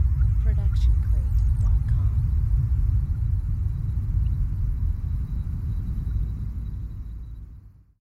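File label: sci fi ambiance; soundscrate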